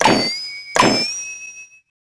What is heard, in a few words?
Ball pop